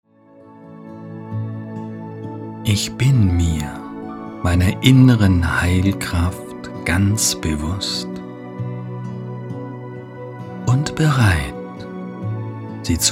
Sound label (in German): meditation; darm